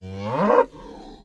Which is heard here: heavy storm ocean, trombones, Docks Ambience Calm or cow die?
cow die